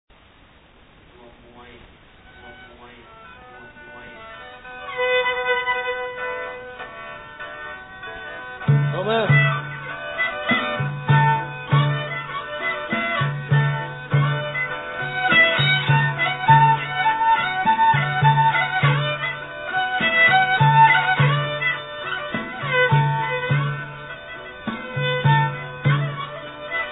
Instrumental Music, Lao Folkdance: Phuthay khen, kachappi, kong hang, so, sing; Savannakhet/2000